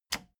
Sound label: plastic button latch loose push enable